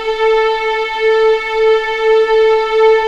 A4 LEG P, VIOLINS; East West; The Ultimate String Collection

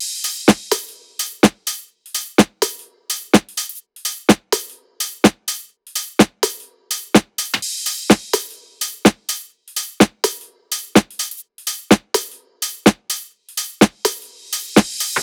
loop top 126; amphitrite; Seismic Loops; SEISMIC2

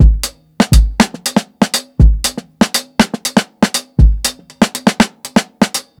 120 OFF BEAT, DRUMS LOOPS; BASEMENT DRUMS VOL 2

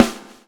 swing

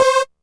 Synth Hit C; Zero-G Trance Inducer; 90 s Sample CDs

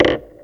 Percussive Hit, contact mic

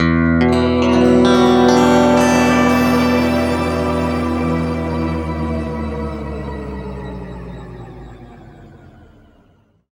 E, guitar chords; East West; Steve Stevens Guitar Samples Collection

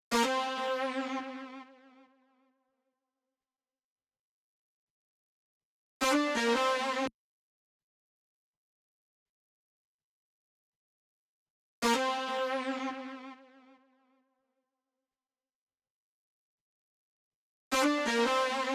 synth 2A; Big Fish Audio; Legendary; Shes Mine

